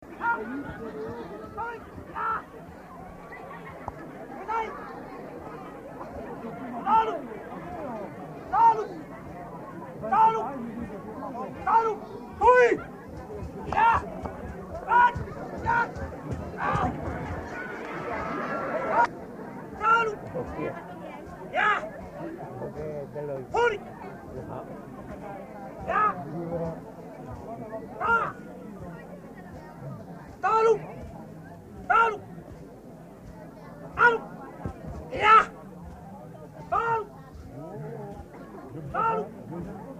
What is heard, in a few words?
mua hommes